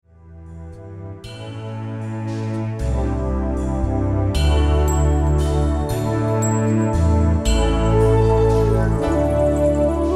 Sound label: Celtic Hymn